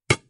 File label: Bombsmack